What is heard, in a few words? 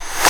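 Clap reverse, left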